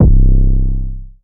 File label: 808 Heavy (C); Cymatics; GHOST (Beta Pack)